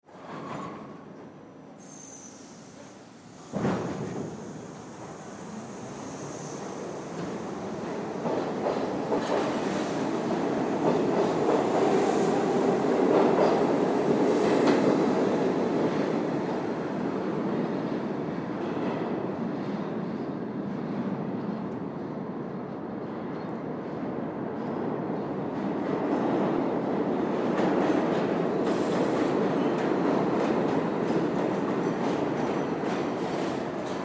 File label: Subway Interior, Subway Interior Ride; Brake Fast Squeak; Transportation; Subway Sound Effects; Subways (Sound Effects:Vehicles); Blastwave